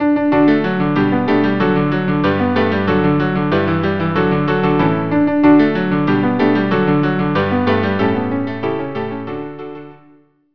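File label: cello piano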